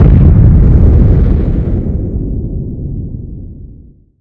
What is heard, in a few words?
bomb; Weapon-Explosion